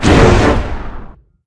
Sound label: Woosh